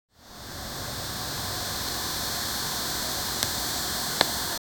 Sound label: roasting in the oven, baking